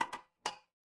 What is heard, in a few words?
glass ceramic